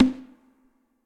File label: Tube CardBoard, Majestic Mallets; Multisampled; Ableton; Mood Reel